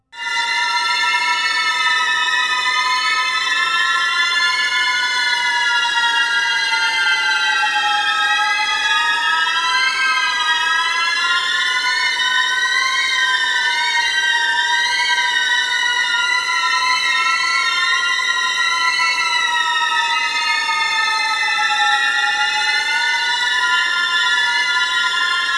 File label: unused violin